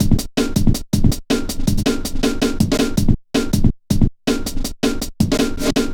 metallic stepz, drum loops; Zero-G Jungle Warfare 1, 2 & 3

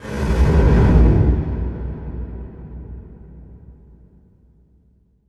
impact flame, Cinematic Impact

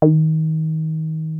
303 D#3, TB 303; Hollywood Edge; Giorgio Moroder Rare Synthesizer Collection

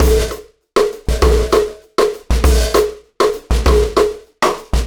Beat, Processed Drumkits; Event Horizon

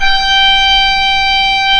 REEDS G5, organ; AKAI S-Series CD-ROM Sound Library VOL-8

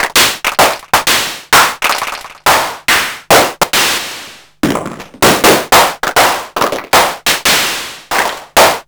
Simmons Clap

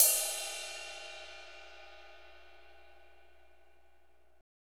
cymbal R&B ride, Roland; Northstar; Drumscapes Roland; Cymbals 2

